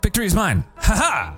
victory

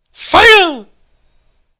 fire

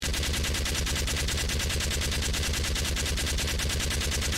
sentry gun shooting

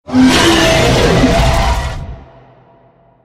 dragon attack talon enter; npc

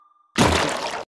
dropped slime; puniru

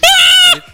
scream

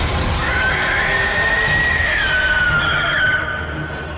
beast scream notification sound; cleric beast

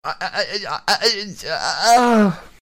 uauaughghgh

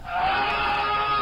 aaaaaaaaaaaaaa unknow sound aaaaaaaaaaa

